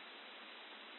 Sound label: noise